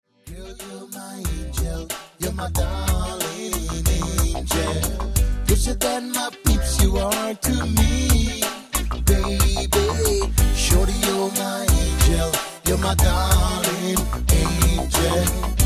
Snipets of Reggae, Live Band

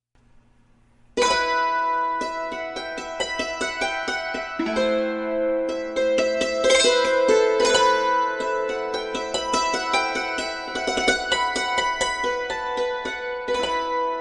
Hammered Dulcimer

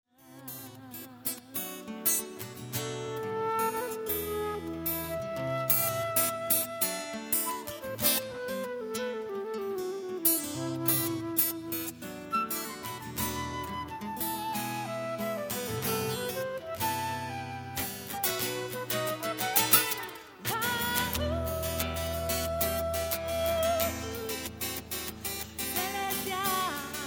flute, acoustic duo

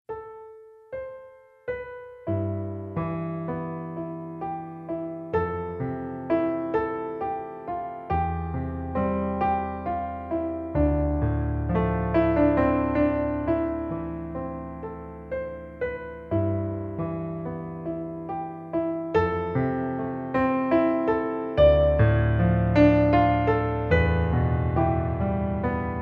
Piano

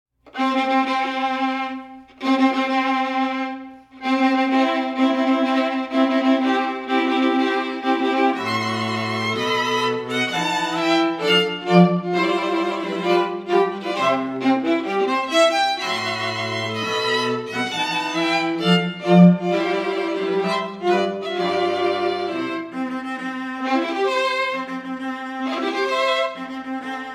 Wedding March, String Quartet

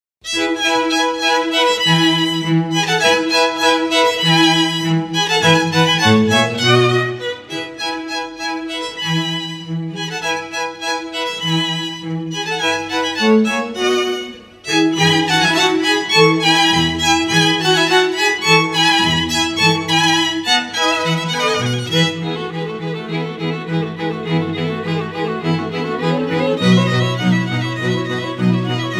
String Quartet; Four Seasons - Vivaldi; Classical; Violin Guitar Musician

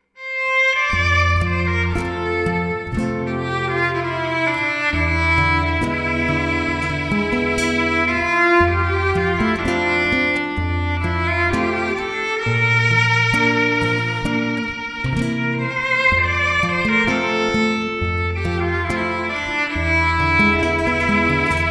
Violin Guitar; Acoustic Duo

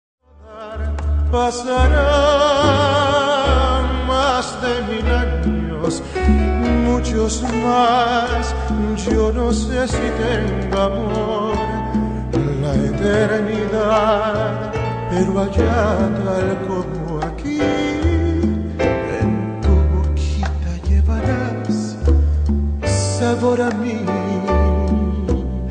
String Quartet; Gabriella Strings

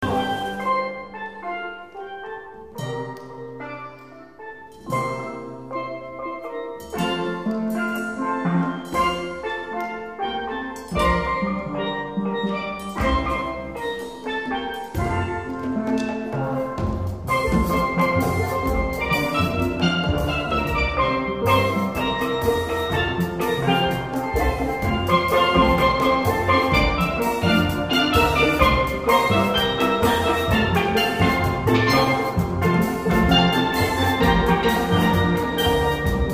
Steel Drum

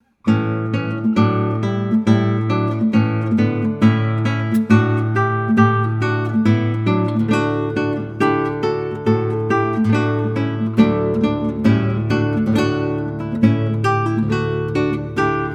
Ode to Joy solo guitar; Classical Guitar

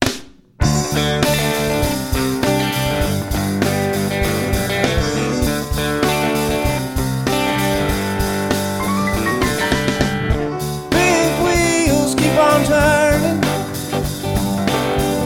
Rock

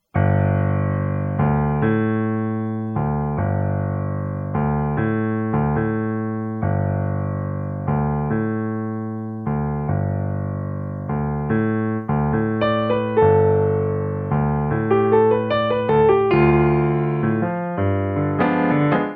piano

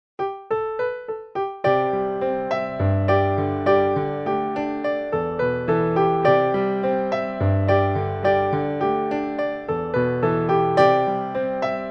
Piano

